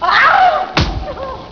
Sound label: Fight; 游戏音效; 打斗音效; 特酷声音素材库